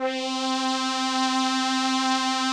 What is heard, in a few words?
SOLINA, String Machines